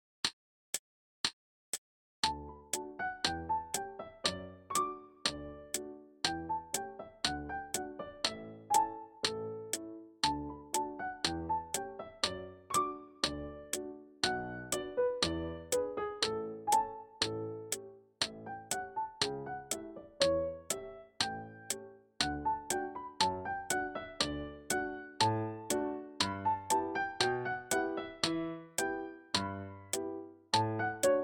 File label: gavotte, no repeats medium tempo with solo